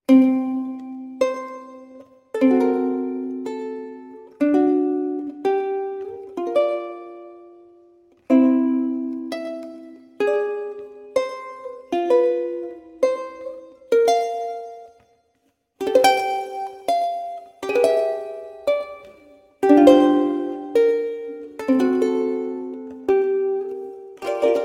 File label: uku